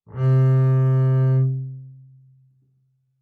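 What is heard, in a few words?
Bass arco fortissimo sul D C4, Double Bass; MIS Pitches - 2014; Strings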